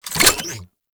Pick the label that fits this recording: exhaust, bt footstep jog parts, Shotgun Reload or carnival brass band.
Shotgun Reload